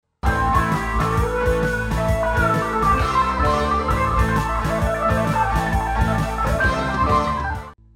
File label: Car Boogie